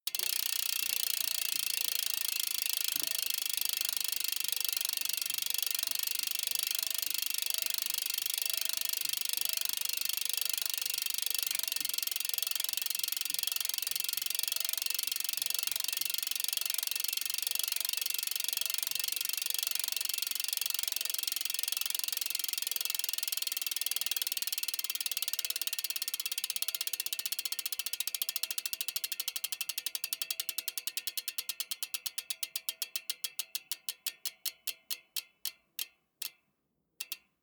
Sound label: bmx bike freewheel constant fast